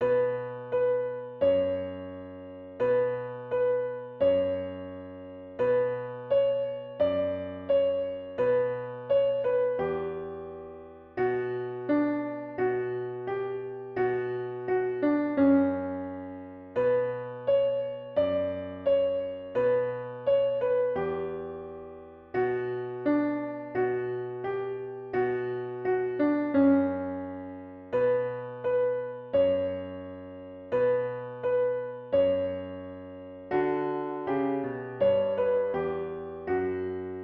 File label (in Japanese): ピアノ